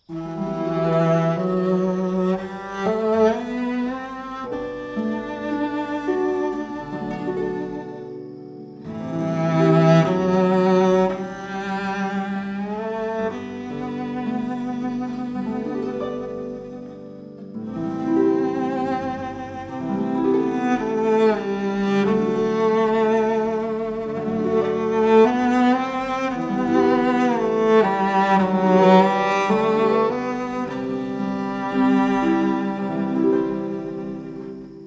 cello solo

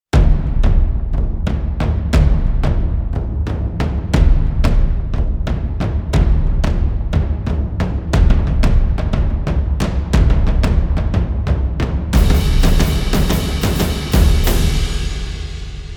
drums build

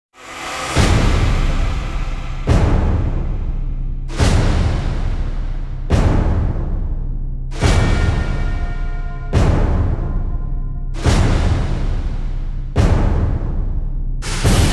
drums build

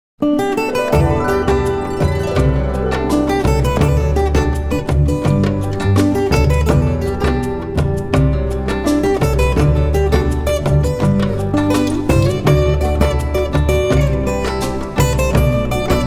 guitar version

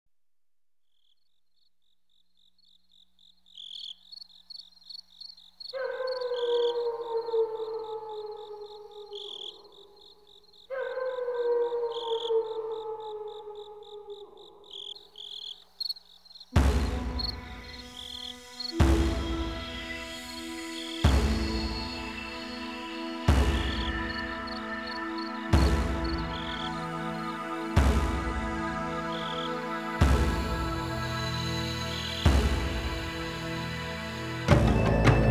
sacred earth drums

